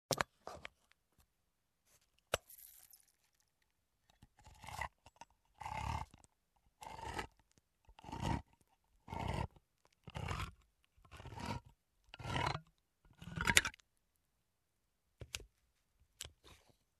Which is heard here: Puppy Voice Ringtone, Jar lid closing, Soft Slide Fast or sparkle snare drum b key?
Jar lid closing